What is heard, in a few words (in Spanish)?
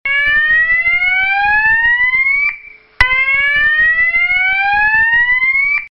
sonido electronico